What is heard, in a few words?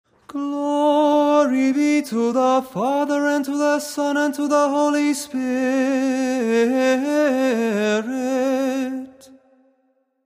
Tone 8 glory be